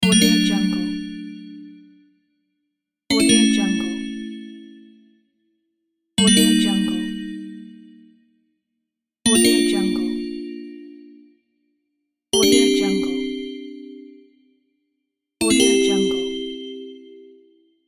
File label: game treasure found